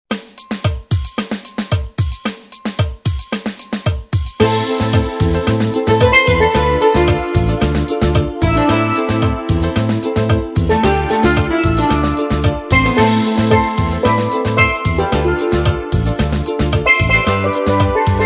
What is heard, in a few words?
steel and voices